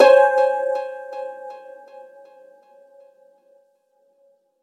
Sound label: Harp String Pluck